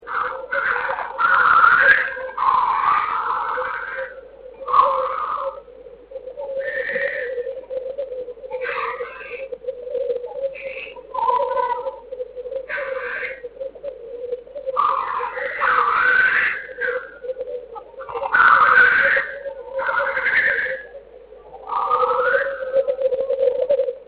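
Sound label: black grouse, birds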